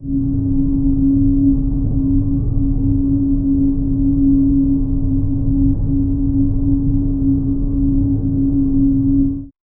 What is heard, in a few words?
low industrial drone meta